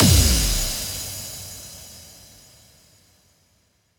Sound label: EDM